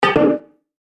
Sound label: Chime Percussion; Multimedia Web Interactive; Hits (Sound Effects:Musical)